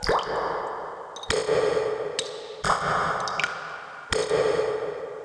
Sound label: 4 drips